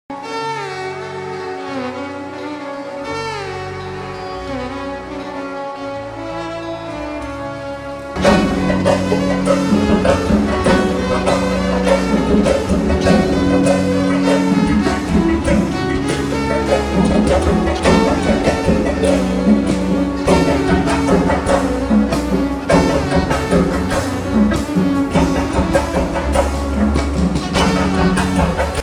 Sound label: Ringtone Slowed Reverb; tamil; amaran